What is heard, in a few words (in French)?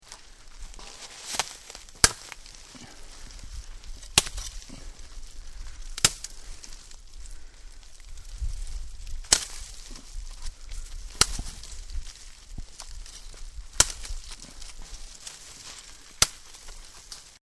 percer la glace